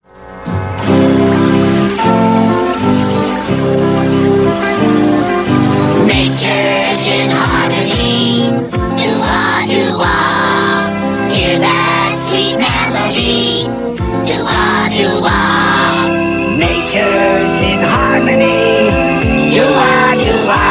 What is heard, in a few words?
harmony